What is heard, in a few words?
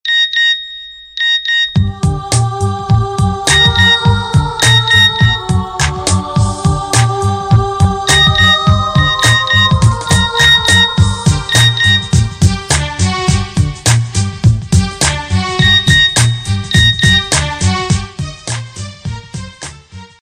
message tone ringtone